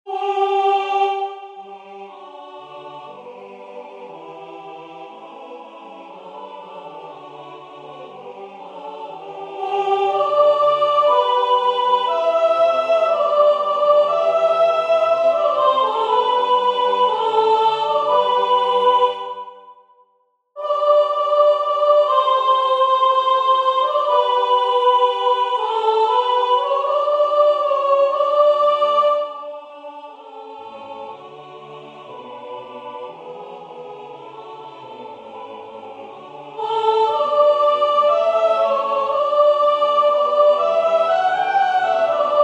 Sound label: Soprano 1; Charpentier 4 Chorus of Shepherds; Toronto Choral Society